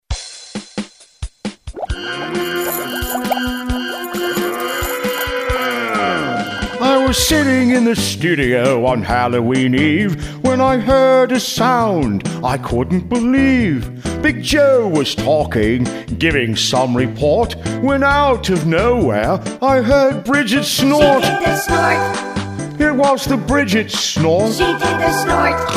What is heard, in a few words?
snort